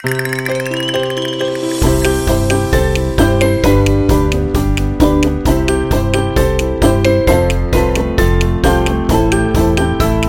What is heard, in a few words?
Funny Game; cooking show